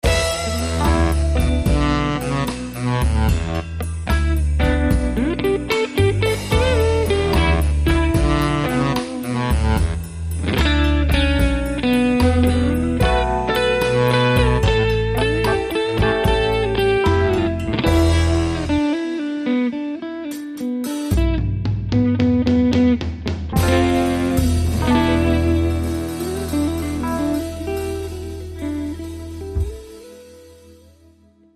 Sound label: Blues